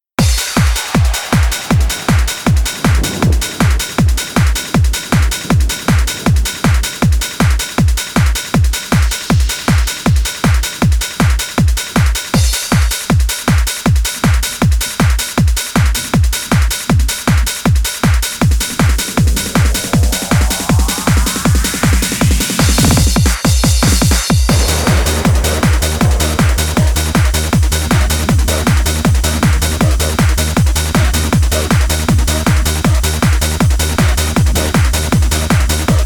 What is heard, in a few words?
Thunder uplifting MIX